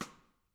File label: Snare Xstick Mid, Snare Drum, Modern; Membranophones; Struck Membranophones; Snare Drum, Modern 2